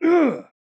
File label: man injured grunt; soundscrate